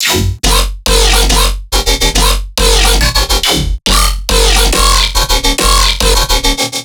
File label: Riddim Bass Loop 140 F#; Dubstep; Riddim Basslines; Craft; Ghosthack; Advent Calendar 2019 Day 17; Riddim Bass Loops